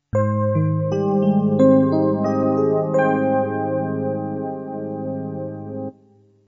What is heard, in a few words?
jingle